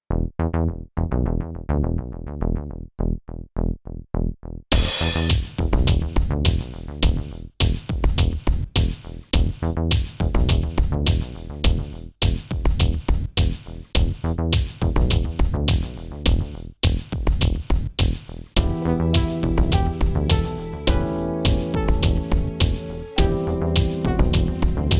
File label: Synth Jungle Instrumental